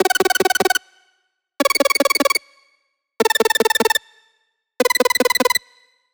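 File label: 8Bit 150BPM Arp Loop F# minor; Antidote Advent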